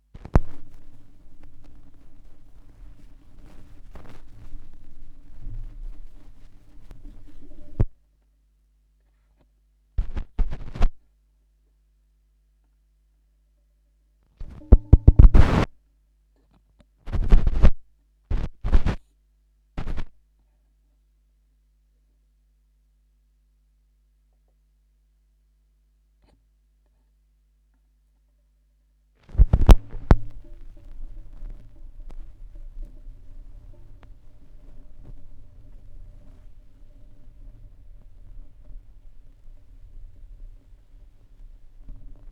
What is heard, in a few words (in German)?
schallplattengeraeusche, Vinyl